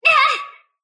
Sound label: sylph pain; dota; dark willow